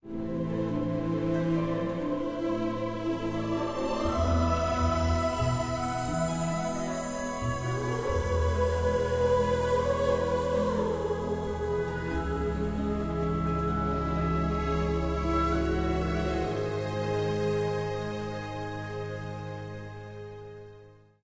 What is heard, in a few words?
fanfare